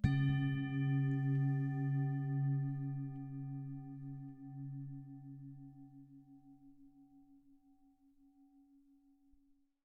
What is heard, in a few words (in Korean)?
싱잉볼 사운드